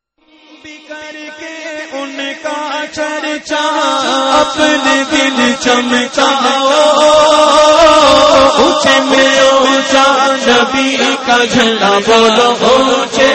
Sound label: Naat; Faizan e Naat